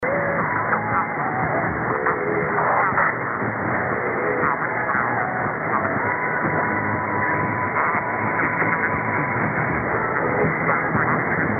Absolute Radio

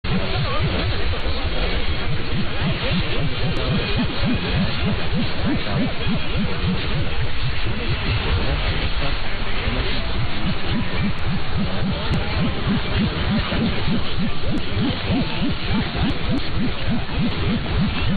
1000 Cuban wobbler

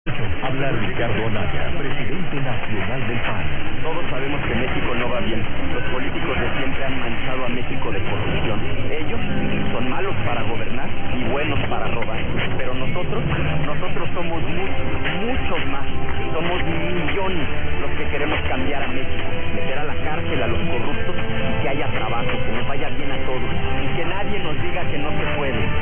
Radio Mil Mexico, radio

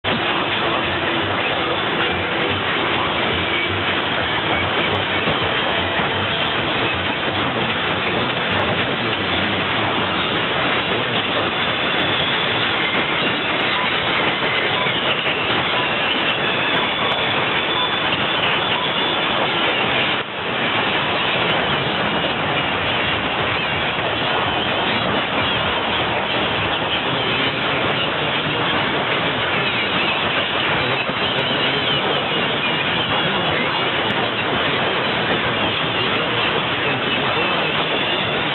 time pips; SRR Romania; Hora Exacta

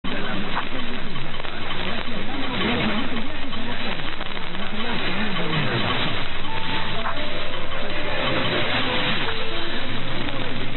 Nacional Radio Argentina ID time pips; LRA7

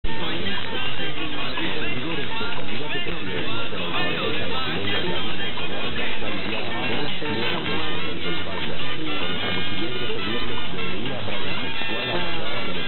Radio Nacional de Colombia; HJND

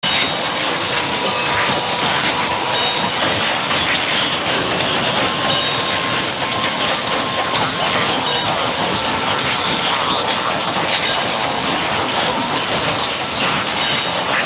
Radio Qom; IRIB

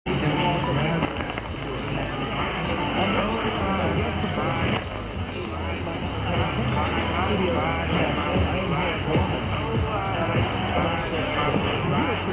music; VOA Sao Tome; VOA 1 The Hits ID

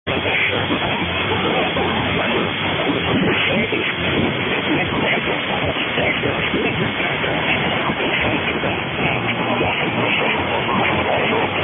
RAI Radio 1 with music, radio

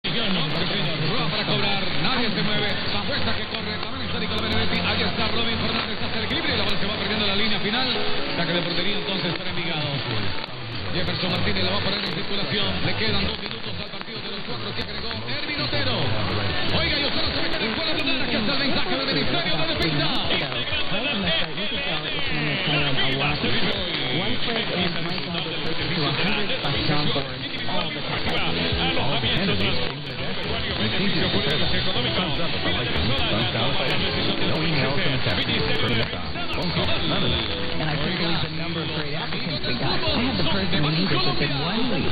RCN Radio Colombia

